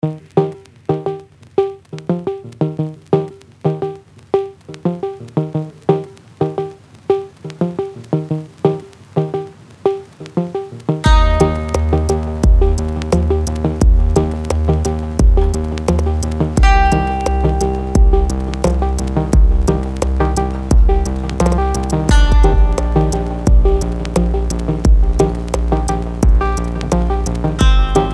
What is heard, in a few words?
quartet; weeklybeats-2014